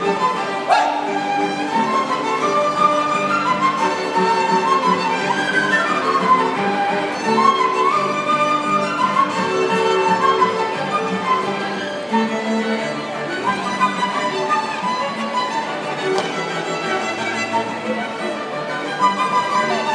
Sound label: covent garden strings